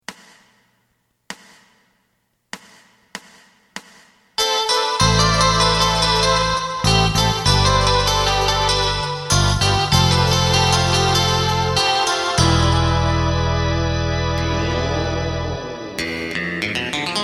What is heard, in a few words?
drum